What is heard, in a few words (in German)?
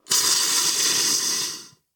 Druckablassen Sodaclub